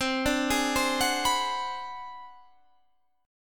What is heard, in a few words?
Ab 7th Sharp 9th Flat 5th, chord